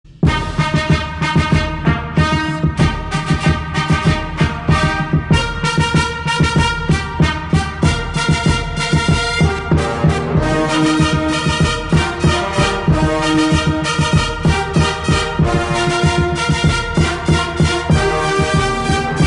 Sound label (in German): fanfarenzug durch hausen